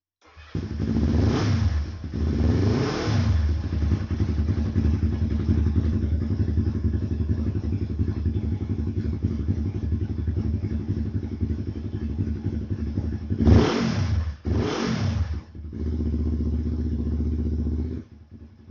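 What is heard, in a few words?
LAVERDA 750S FORMULA SOUND, motorcycle; 1998 laverda 750s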